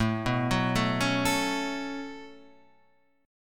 C sharp 7th Suspended 4th Sharp 5th, A, guitar chord